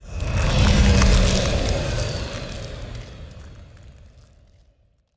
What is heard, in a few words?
pro ship mauler move; biogenesis